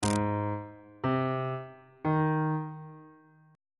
g sharp ionian chord 1 is g sharp major on bass clef